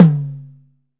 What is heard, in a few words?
TOM, Drum Dry; East Collexion; Drum 1